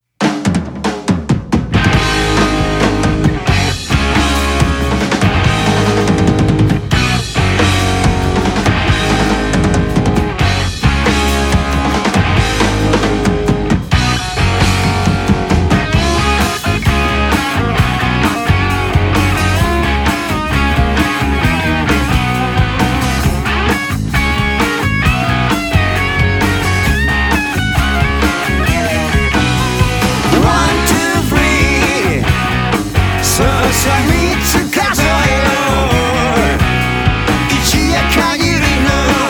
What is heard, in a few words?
rock